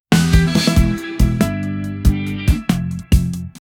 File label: 90s old sitcom rock drum gu